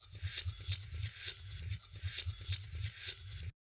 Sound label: rhythm; ueberschall score fx